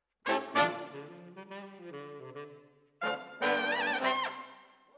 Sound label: Brass